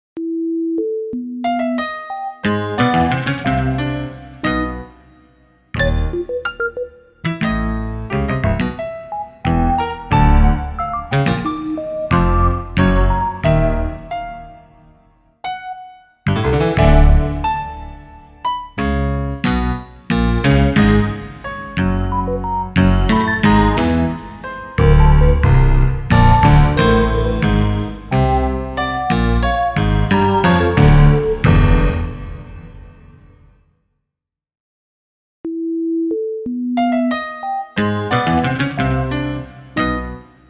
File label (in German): quartett; compositions pour le theatre et le cinema